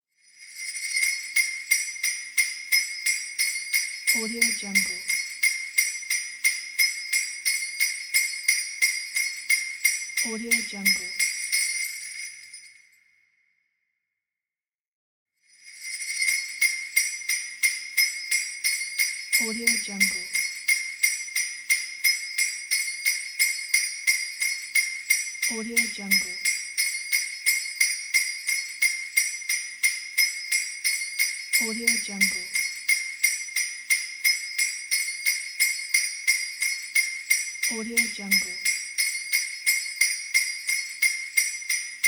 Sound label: christmas bells